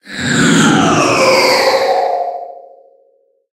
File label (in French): Cri Méga